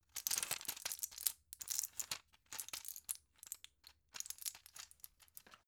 plastic blister handle long

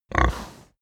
pig grunt breath

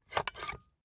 puncher squeak punch

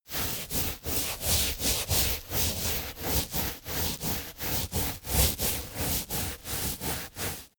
balloon scrub hair

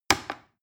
plastic button little click heavy slow

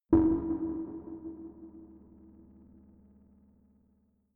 space signal hit

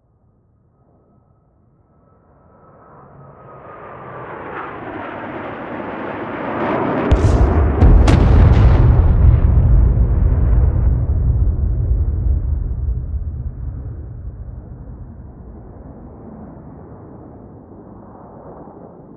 air strike

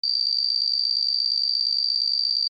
title running layer signal

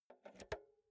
insert jack multi socket

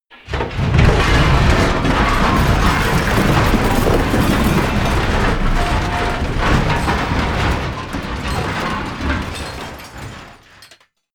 metal loud medium

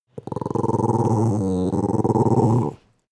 dog small growl wavy

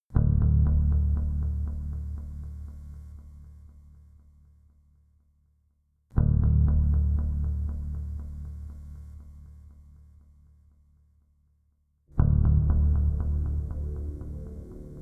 sequence bass hits

